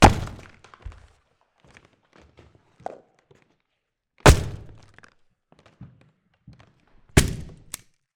axe

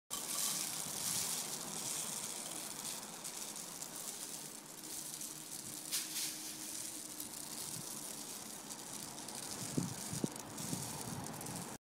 Rainsticks

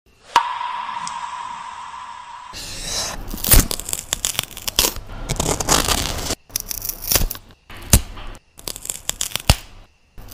Peel

